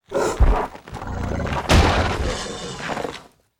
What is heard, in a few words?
TWEEZER WAR DIE